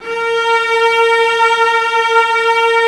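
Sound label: Cello A#3; Multisamples; Digital Sound Factory Virtuoso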